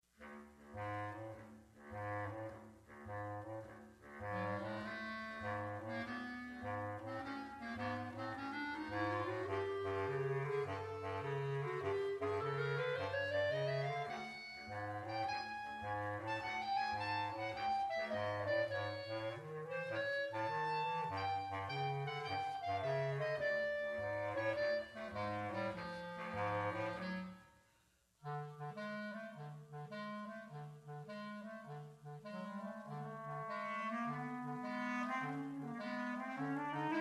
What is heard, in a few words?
clarinets boogie